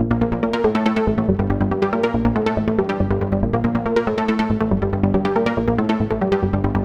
synth arp; Electrocution

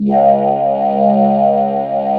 VOCODER; Sound & Vision - Gigapack I CD 2

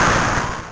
Distortion, Genesis; Sonic 3; Launch Base; M8

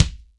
KICK, MG KICK

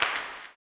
CLAP, Blues Soul; Spectrasonics Vocal Planet

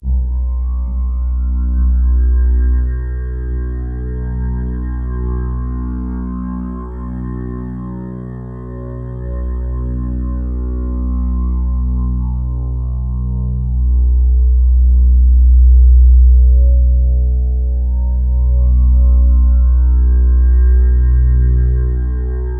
Atmos B1, Club Techno; Zero-G

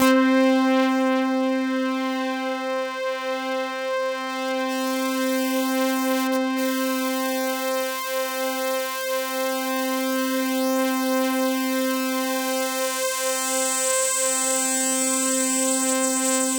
POLY SAW, Roland JV 2080, C4

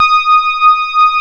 soft rhodes D#5; East West; Global Sounds; D50

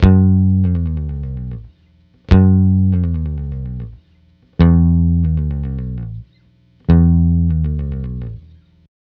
Multi Guitar Bossa Bass-F#, 105; Zero-G Kocktail Kollection; Construction Kitz (Lounge)

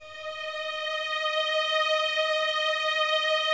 Violins Soft D#5, String Ensembles; Miroslav Vitous; 11 Violins

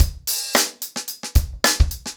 110BPM, acoustic drums; The Roots Drumloops

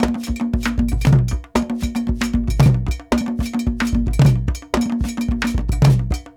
Mai, full ensemble, world percussion; Sony CS - World Percussion

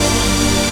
chord 1, sound chord; Ueberschall; Techno Trance Essentials

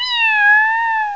MEOW; M8; CMI Factory

